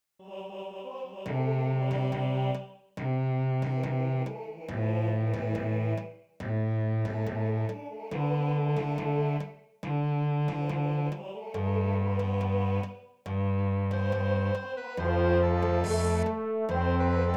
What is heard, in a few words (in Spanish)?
TODOS PERC; CLASS BAND